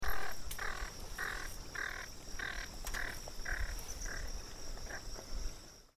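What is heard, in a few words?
zheltaya caplya golos, squacco heron voice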